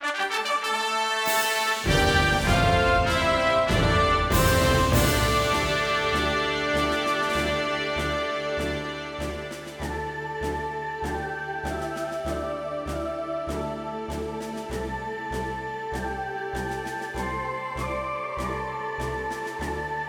Victory Fanfare; Dynasty Warriors Dynasty Tactics

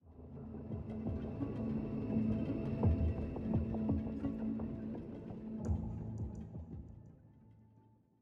85bpm

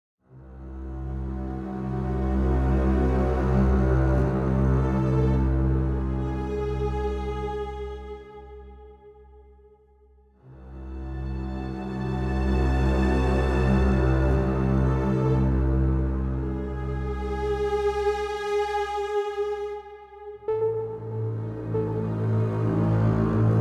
Dark Ambient; dying light the following gamerip 2016

